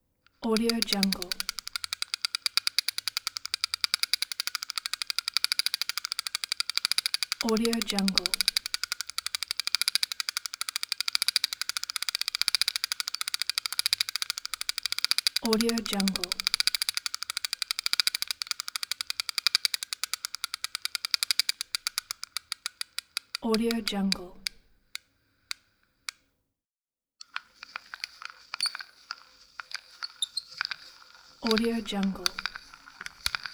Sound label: rotating mechanism; robertbushmanfx